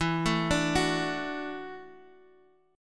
E 7th Suspended 2nd Suspended 4th E down Guitar Open F; arpeggiated